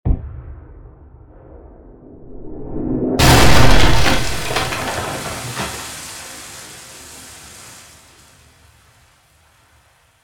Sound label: cannonball